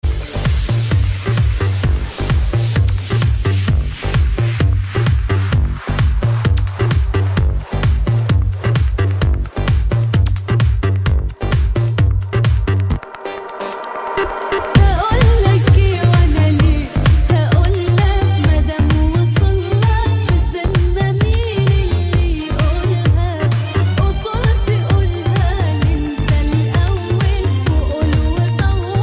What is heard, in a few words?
High Trance